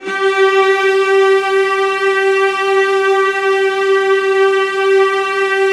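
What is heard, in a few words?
VIOLAS A4; ILIO; Synclavier Strings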